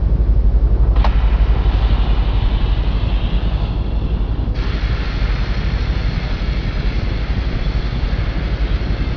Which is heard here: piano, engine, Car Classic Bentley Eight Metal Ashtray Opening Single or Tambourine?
engine